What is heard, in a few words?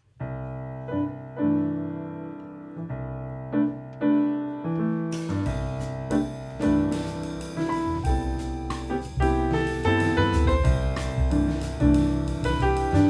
Quartet Live; Live at The Music Room